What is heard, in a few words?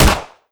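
ar2 distant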